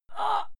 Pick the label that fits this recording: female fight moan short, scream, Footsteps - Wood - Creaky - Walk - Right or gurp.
female fight moan short